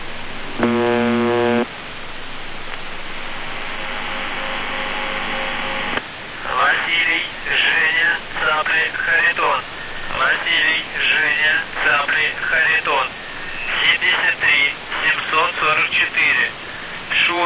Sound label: buzzer usb msg